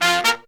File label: Horns, 120BPM; 90 s Sample CDs; Zero-G; Phantom Horns